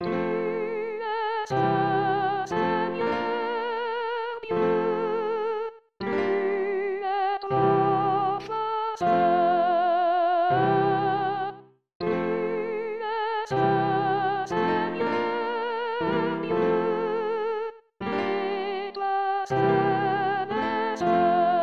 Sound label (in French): Tu Es Saint Seigneur Dieu Soprano Accords; Chorale